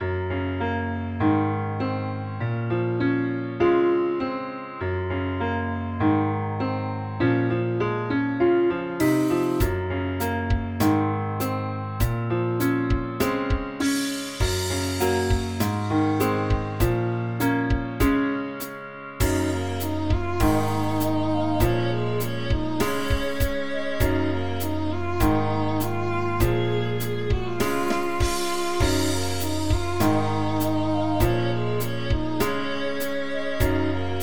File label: FluidR3 Steinway C